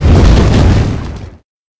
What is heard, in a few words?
fireball explode